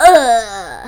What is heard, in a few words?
dies; player; isaac